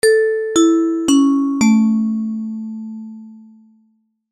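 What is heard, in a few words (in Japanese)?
お知らせ・終わり・遅め; 戏剧音效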